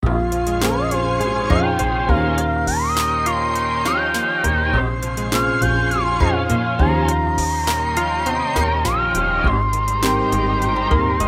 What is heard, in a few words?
Theremin Whistle Notes